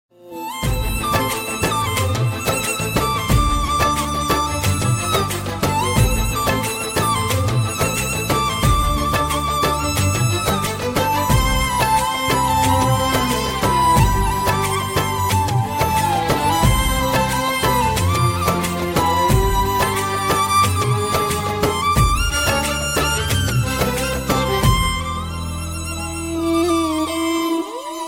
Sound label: sad flute ringtone